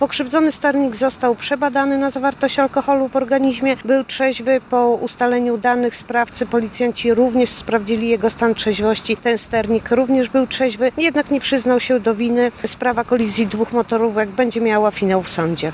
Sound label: motor